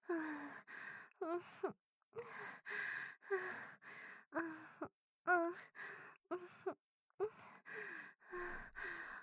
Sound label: moan slow; oppaigames